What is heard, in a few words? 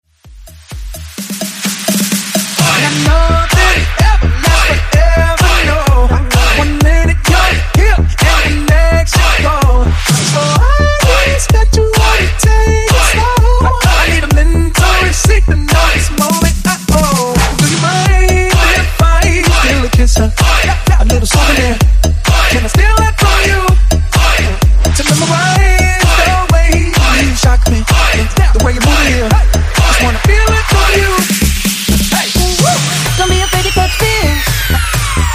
Bounce Mashup